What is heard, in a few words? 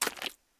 mud; firefight